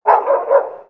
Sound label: dog; modern warfare 2; amb elements